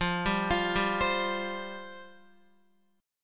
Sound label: F Suspended 2nd F Guitar; Fsus2; arpeggiated; x,8,7,10,7,8